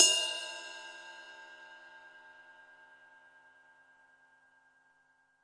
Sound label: real, drum kit; REAL LIVE KITS; A Real Freakin Drum Kit